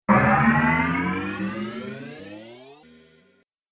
Rising Bang Impact; Miscellaneous Impact Sound Effects

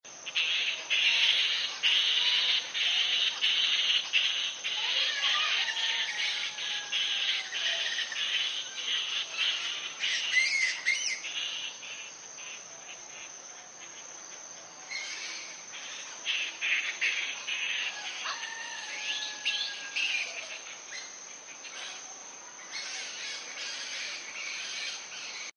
Jungle Temple Monkeys; Miscellaneous Animal

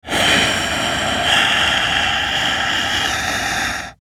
VOCAL BREATH, Breath Male; Breathing; Production Element Imaging Element Accent Transition

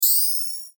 ASCEND BRIGHT; Ascending; Multimedia; Ascends; Sound Design; Blastwave

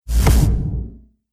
Cinematic Strike Low N Heavy; Trailer Sound Effects

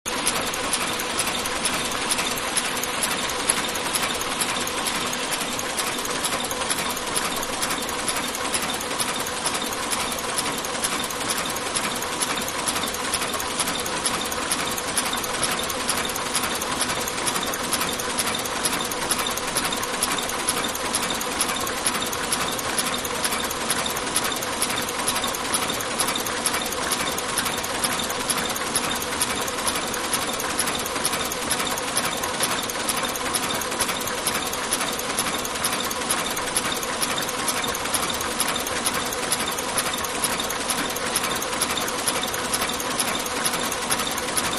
Print Shop-Ambience; Stores and Malls; Backgrounds; Manhattan Production Music

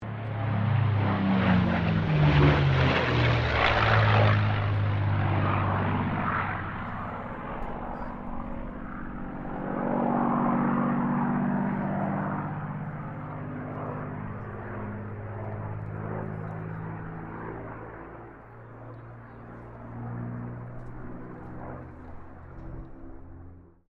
AIRCRAFT CAC CA-18 MUSTANG MK23 PASS STEREO; CA-18 /P-51 Mustang; Airplanes

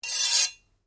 Blade Scrape Medium, Sword; Foley sounds for melee weapons; Swords (Sound Effects:Combat)

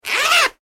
zipper; foley

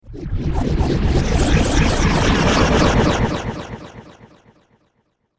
Organic Alien Slurp Machine; Science Fiction Sound Effects

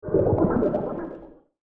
Underwater Wave Whoosh

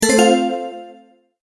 multimedia tone advance; Tones (Sound Effects:Sound Design); McKinney Sound

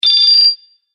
Collect Jewel Rapid, Video Game Sound Effects

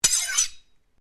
Blade Swish Low, Sword; Foley sounds for melee weapons; Swords (Sound Effects:Combat)

